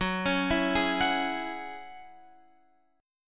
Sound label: Gb 7th Suspended 2nd Gb down Guitar Open F; Gb7sus2; arpeggiated